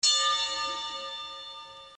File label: catchphrase bell